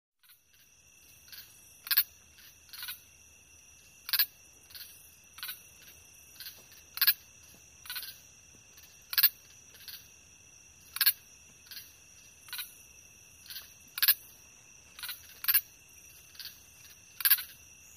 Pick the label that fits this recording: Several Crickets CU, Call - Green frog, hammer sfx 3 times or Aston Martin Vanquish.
Several Crickets CU